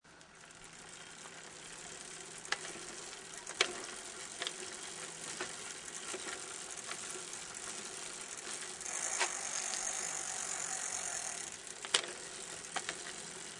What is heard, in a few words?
Bicycle: Cycling, close perspective. Clear bicycle gears; Bicycle: Cycling, close perspective. Clear bicycle gears definition; Bicycles